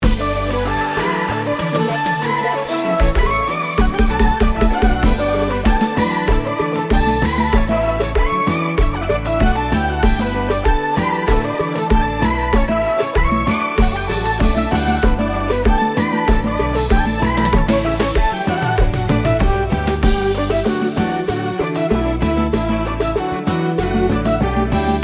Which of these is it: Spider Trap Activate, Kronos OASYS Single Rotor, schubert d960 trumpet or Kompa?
Kompa